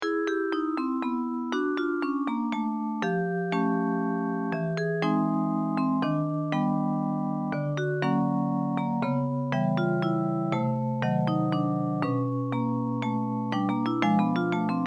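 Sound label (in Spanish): danzas antiguas mazurka guitar; 3 danzas antiguas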